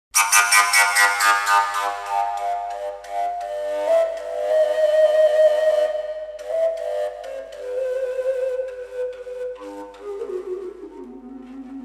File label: overtone fujara